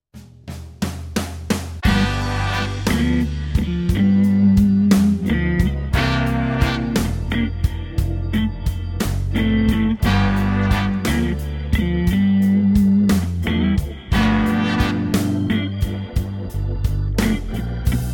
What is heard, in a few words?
slow blues g